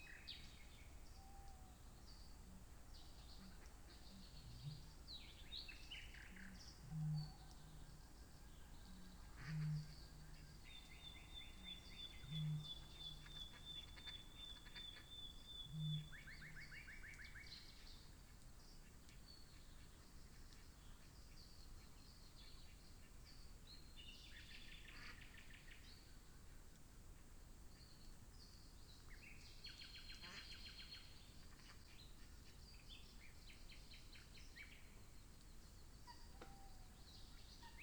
Nature European Wetland Pre Dawn Chorus Stereo Binaural